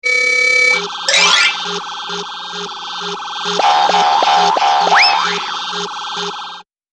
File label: Cartoon Scifi Alarm